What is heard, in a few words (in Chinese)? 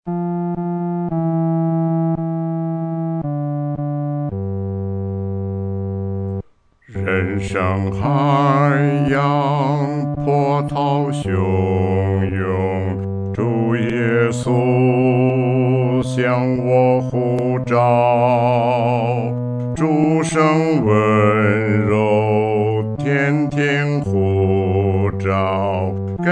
独唱 第四声